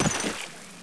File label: player slosh